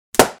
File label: Pop; mekanism